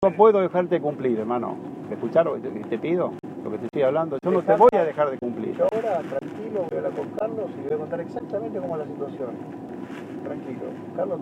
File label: CUMBIA